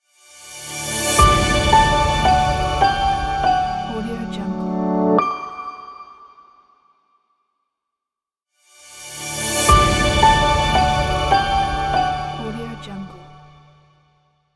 revealing sting logo

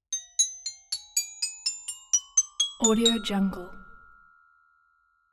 int xylophone tip toe; collanderson

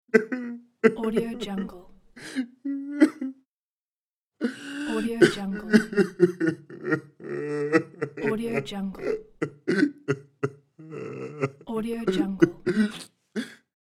man crying

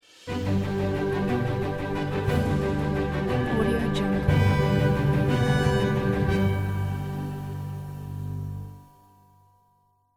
noble epic outtro logo

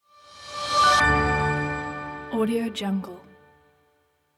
piano logo

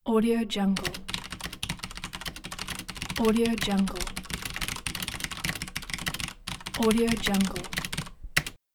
keyboard typing

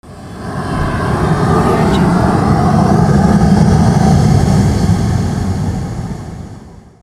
flame thrower

